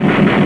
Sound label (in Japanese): kikannhou